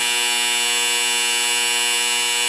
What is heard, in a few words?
buzzer; whose line is it anyway